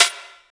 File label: SD, Drum & Bass, 170 BPM; Ueberschall; Drum & Bass Flash Trackz